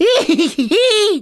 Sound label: Emote Laugh; Target Hungryfrogs